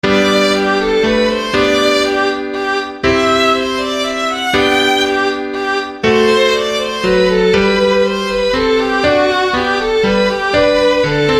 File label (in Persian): menuet, دوئت ویولن و پیانو